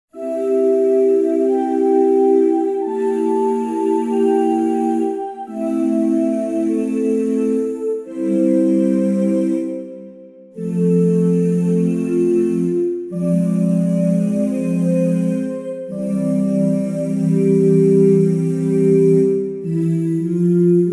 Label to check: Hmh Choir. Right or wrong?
Right